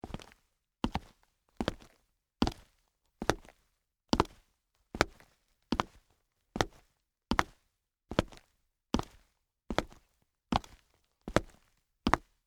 Combat Boots Walk, Footsteps, Combat, Boots, Walk, Wood, Surface, Slow; BlastWave